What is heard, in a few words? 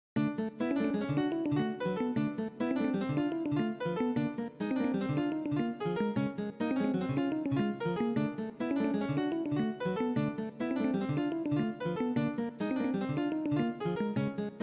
cont rhythm example